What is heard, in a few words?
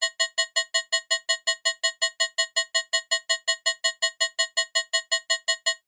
science fiction alarm fast high pitched warning tone emergency